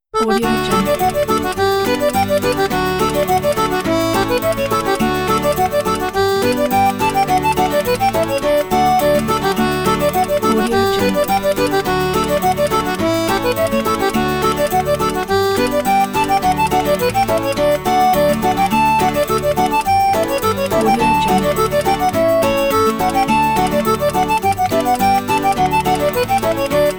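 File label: hornpipe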